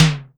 SIMMONS SD-5 drums

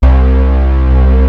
DEEP

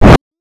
Star Instruments Synare PS-1

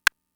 Rim; Fricke MFB-522; SNARES; Drum Machines

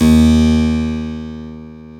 KEY HRPCR; Roland - LA Composer vol. 1

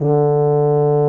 D3 TUBA

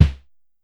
Drum X Snare; Pearl Drum-X

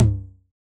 Tom, Yamaha DJX 2B

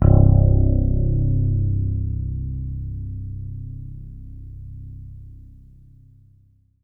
C2, Synth Line

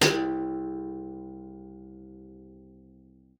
C2, GUITAR; Xsample VOL-2

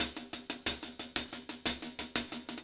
Cymbol Particle; Particles

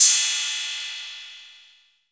BOX CYMBAL, GM drum set; AKAI S-Series CD-ROM Sound Library VOL-8

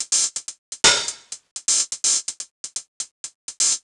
drum loop ohnine hats 125, Classic Chicago House; Sample Magic; Drum Loops

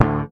SHRT D, Bass; Sampleheads; Will Lee Bass Library

